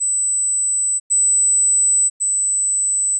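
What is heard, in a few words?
three stereo signals, 8000Hz; Fundamentals of Sound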